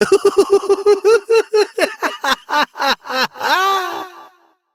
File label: Evil Twin Doppelganger Laughter